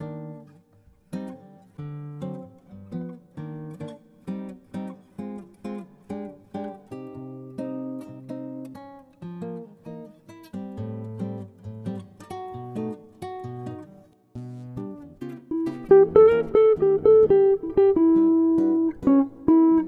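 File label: Utrennij dzhaz, morning jazz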